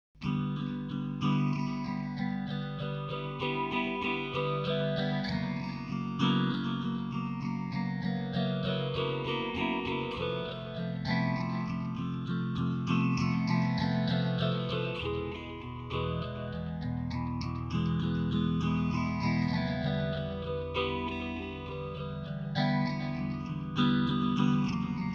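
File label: phaser delay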